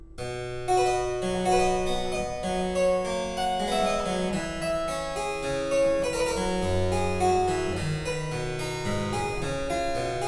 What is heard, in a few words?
Suite In С Major, Saraband, Harpsichord; Purcell edition